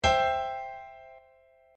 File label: piano chord c; circle of fifths